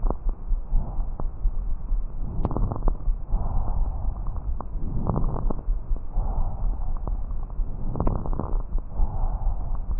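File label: calm breathing with noise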